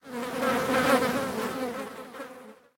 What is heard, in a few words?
flies, ambient outdoor